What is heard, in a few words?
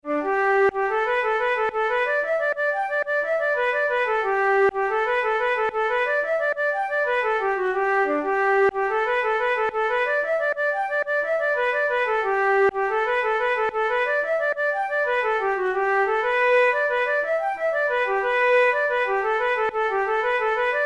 jig, flute